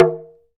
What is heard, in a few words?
TALK DRM, Heart of Africa; Spectrasonics